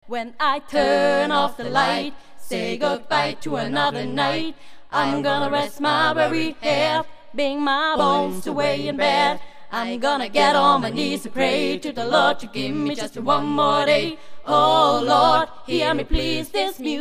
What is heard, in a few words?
a cappella